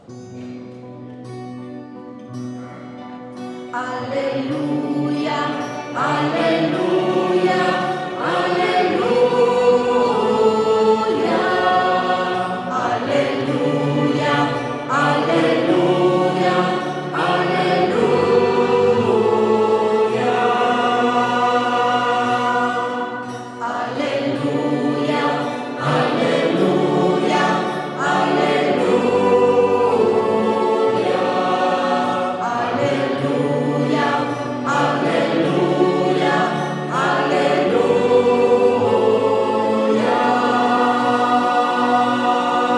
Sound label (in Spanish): ALELUIA TAIZE